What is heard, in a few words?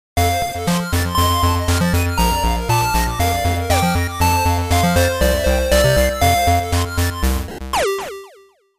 Jingle